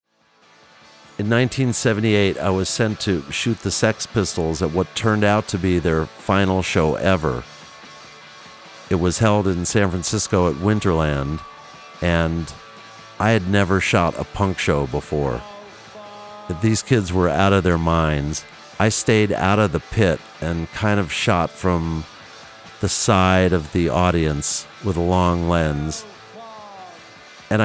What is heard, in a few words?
Playin Bass